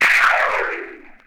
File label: robo whoose, Zaps and Beeps